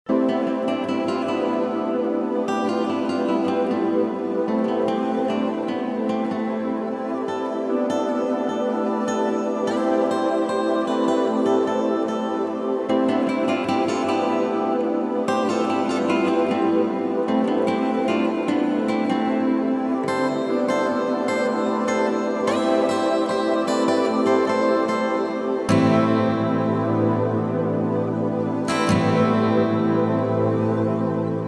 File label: rainy saturday